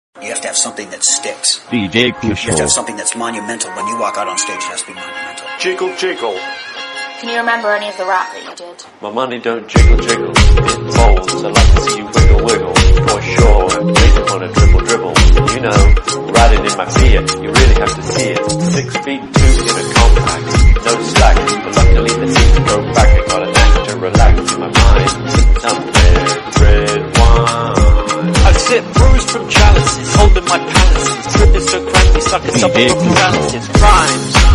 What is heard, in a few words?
Marathi Thumka Version